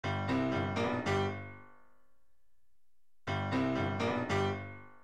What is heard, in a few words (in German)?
i phone klingeltoene piano riff